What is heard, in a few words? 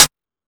Techno, EMU XL-7